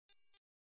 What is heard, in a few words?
Flash Beep HF Elect To, flash beep